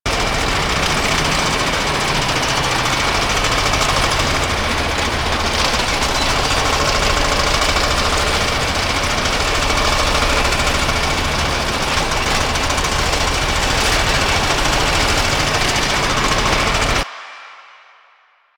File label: Lasrian Tank Treads Loop, Enemies Movement; Warframe